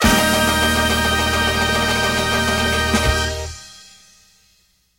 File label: polka ender, stabs